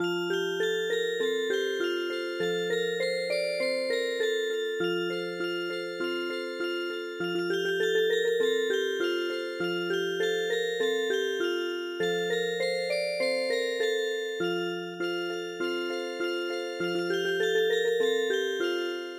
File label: Music Box; frog song